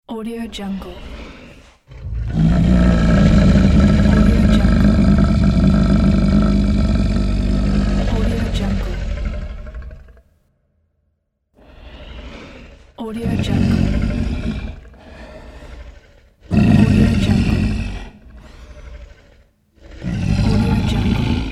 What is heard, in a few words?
large big monster growl